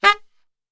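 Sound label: G# saxophone shot, Brass Super Section; Best Service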